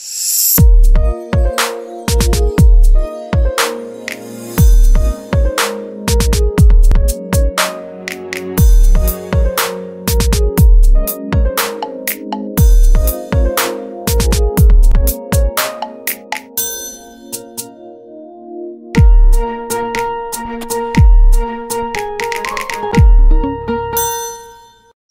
Music Ringtone; Oru Adaar Love

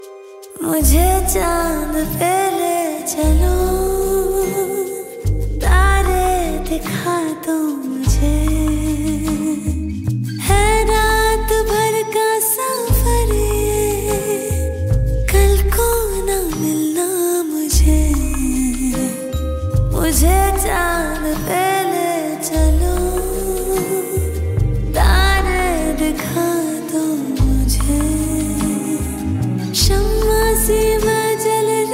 Romantic Hindi Song Ringtone

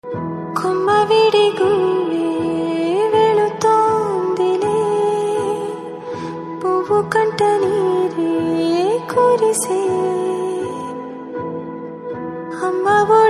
Song

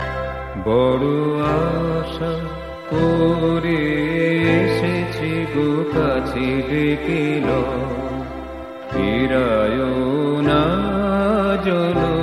Rabindra Sangeet Bengali

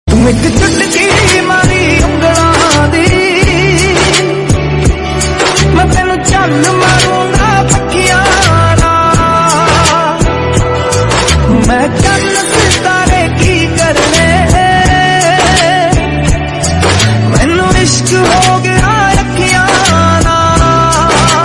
Punjabi Ringtone

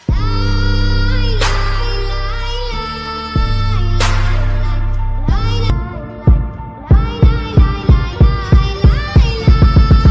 Remix Ringtone

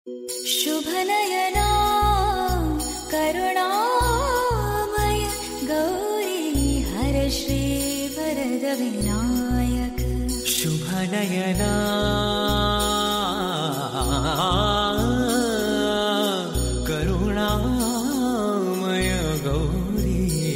Bhakti Song Ringtone

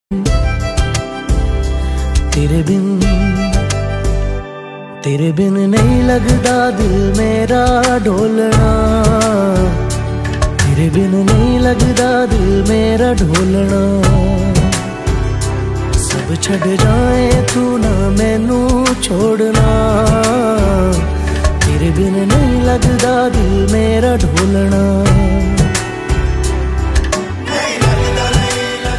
New Hindi Ringtone

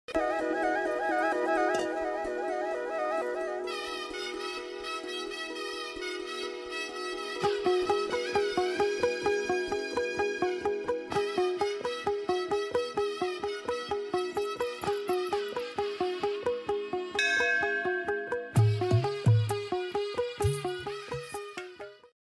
Bgm Ringtone; Geetha Govindam

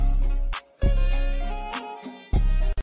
Message Ringtone

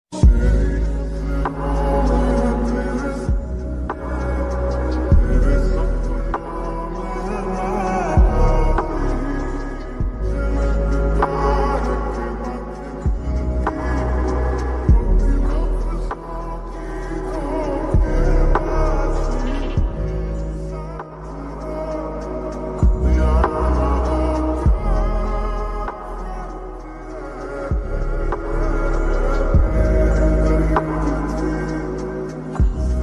Hindi Sad Song Ringtone

